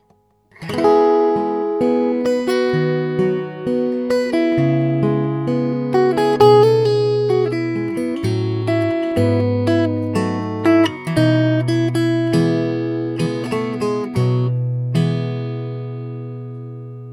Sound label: bourgeois vintage om cutaway